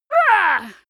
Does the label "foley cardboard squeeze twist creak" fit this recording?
No